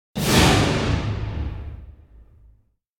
Symphonic Hit Scary Appearance Emergence Chord - Whooshing End; Hollywood Edge; sound designer's toolkit v2